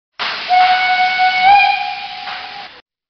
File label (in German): Pfeife